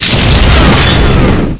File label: SKILL WATER